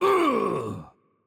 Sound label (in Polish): Hurt Heavy; VO; pl PL; bloom minigame